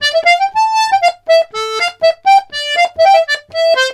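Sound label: Accordions, polka; USB Soundscan vol.40; AKAI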